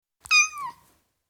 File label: Ten Week Old Kitten, Individual, Cats; BBC Sound Effects Library